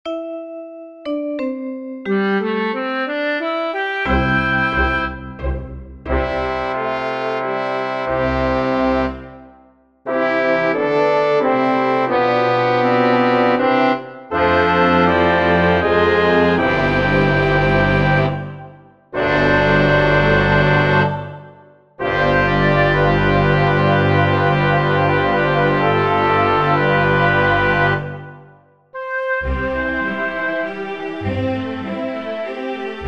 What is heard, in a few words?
elegant outtro